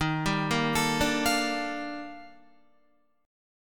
D sharp Major Sharp 11th, Guitar; arpeggiated; JGuitar

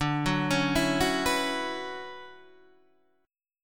D Major 13th Guitar Open D, chord

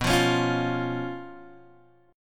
B Suspended 2nd Flat 5th, Guitar, DADGAD

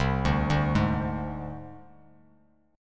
C Suspended 2nd Sharp 5th, down, Bass Standard; Csus2#5 arpeggiated; JGuitar

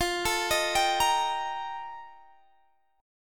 F 11th F, down, Guitar Open G

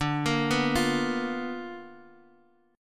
B Minor Major 7th Flat 5th, chord

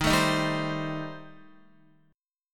Eb 6th Flat 5th Eb down Guitar; Eb6b5 strummed; JGuitar

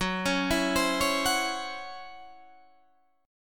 Db Minor Major 7th Double Flat 5th Gb

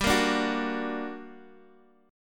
G Minor Major 7th G, Guitar, Half Step Down; GmM7

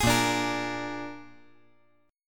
A sharp 7th A sharp

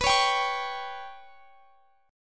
B Suspended 2nd Sharp 5th B, chord

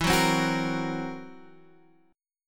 F sharp 7th Flat 5th E

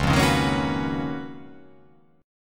C sharp 13th C sharp

